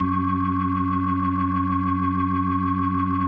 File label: F#2F, B3, Vintage Keyboards